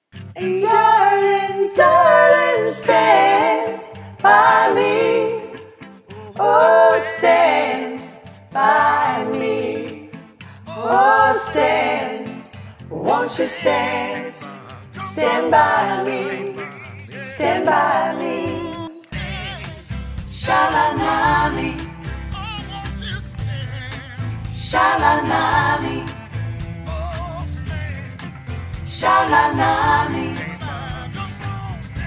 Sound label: harmonies